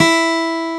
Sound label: Acoustic Guitar E4; VSamp; Demo Bank